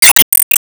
Enemy Metal Damagehit

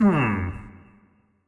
Grunt; Going Under; Marv

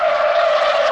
Car - Skid old

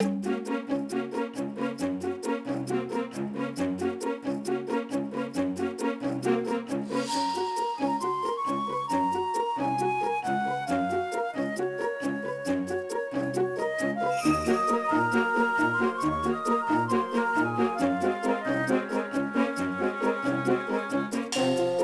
Musicloop; Pokémon Masters Arena

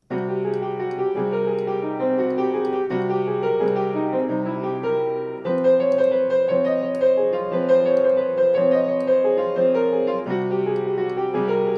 Dungeon

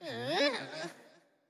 Wimp Aggro

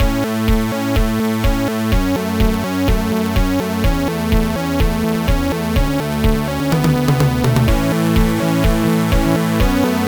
Gameover; 3D World Boxing; Amiga

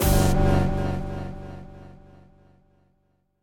Boss Freeze Frame; Avie

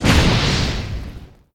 Meteor Shoot

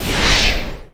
Enemy Sand wall Hide

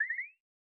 Shake; Mamepato

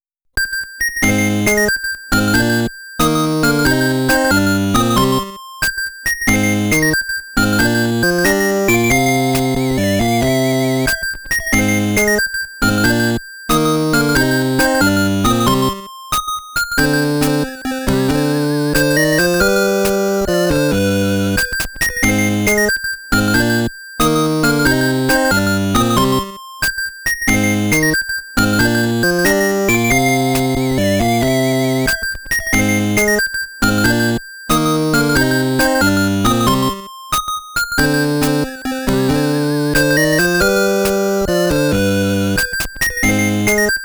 Solemn

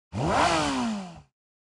Board Upgrade; subway surfers city sound effects; Android, iOS

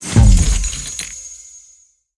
Mystery Box Start

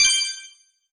Toggle On

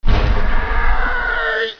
Rabi Die; Virus: The Game

